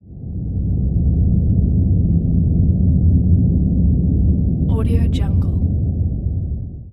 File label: dark transition